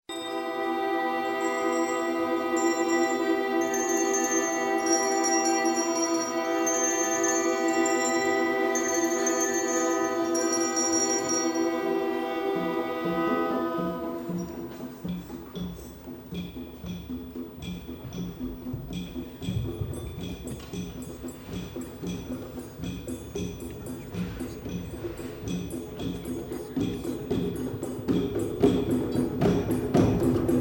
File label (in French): clochettes et 5 temps